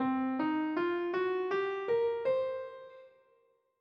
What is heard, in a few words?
blues scale